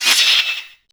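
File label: dwarf axe weapon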